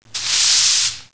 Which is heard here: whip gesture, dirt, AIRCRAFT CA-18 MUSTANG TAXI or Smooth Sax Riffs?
dirt